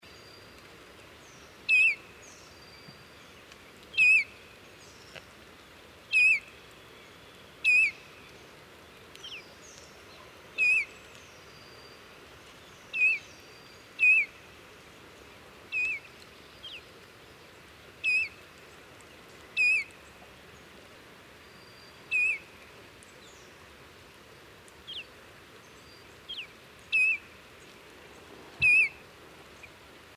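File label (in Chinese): 褐鷽, Yushan National Park; 褐灰雀; 雀科/留鳥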